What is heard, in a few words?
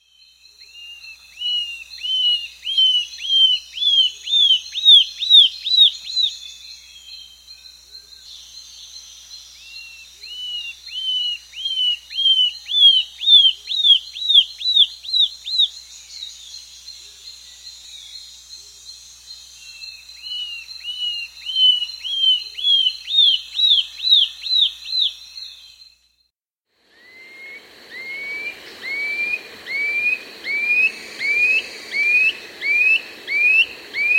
Gray Antwren; S. America